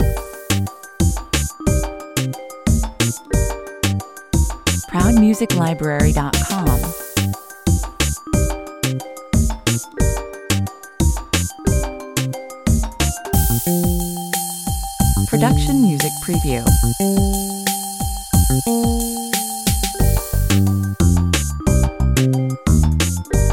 Internet flash arcade video game music. Reminds 8bit style games, 180 bpm